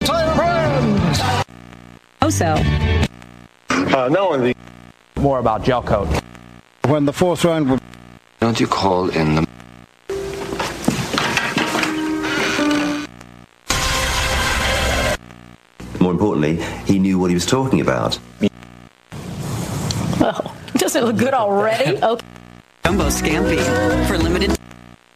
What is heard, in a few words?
Channel Surfing